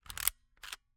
Camera, Kodak Extramax, pocket, 110 film, plastic, metal, advance film, slide lever; Eiravaein Works; Vaeyan IV